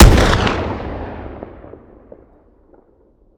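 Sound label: weapon pistol mag fire player atmo ext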